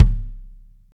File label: long tail reverb kickdrum C key, steel kick drum sound tuned to the C note